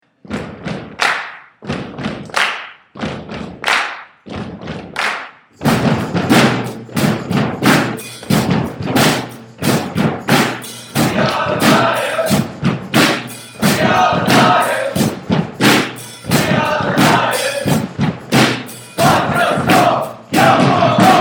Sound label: School Chant